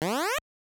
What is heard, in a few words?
door toggle; metroid